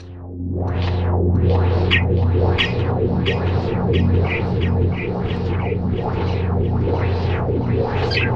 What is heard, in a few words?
Hovering Ufo